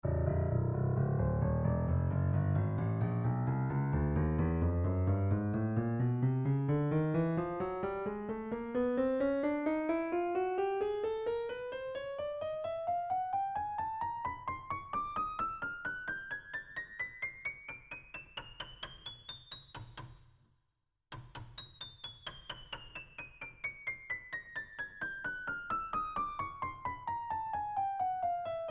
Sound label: scale, Salamander Grand Piano; NoctSalamanderGrandPiano